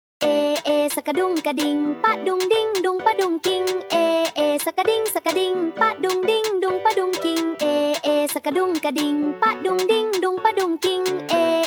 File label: Funk